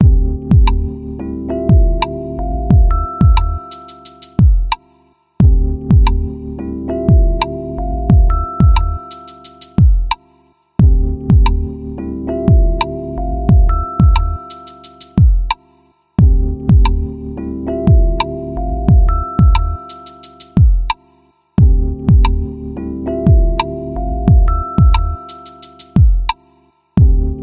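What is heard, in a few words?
Bottles, 90 bpm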